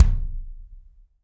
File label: BD AMBIENT, Real Mega Drums; Best Service